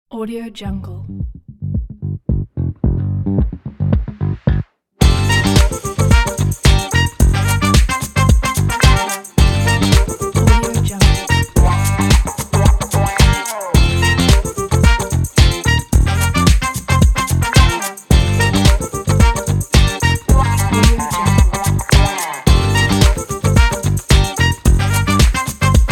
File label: uplifting funk groove vlog